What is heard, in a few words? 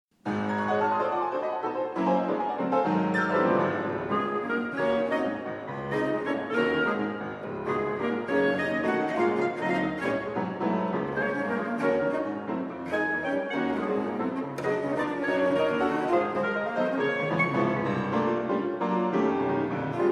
clarinet trio; gershwin